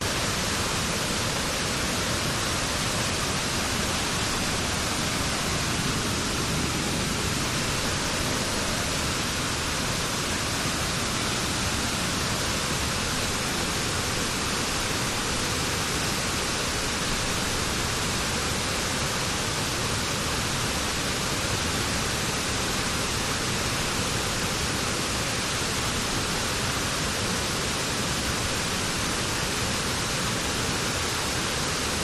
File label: waterfall front medium distant; bridal falls; your free sounds